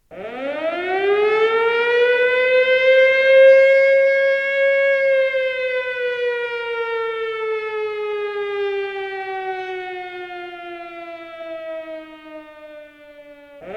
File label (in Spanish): sirena